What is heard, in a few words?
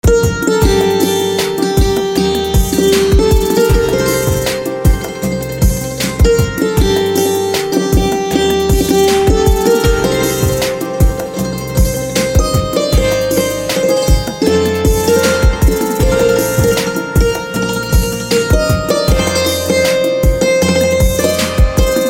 Santoor Instrumental Ringtone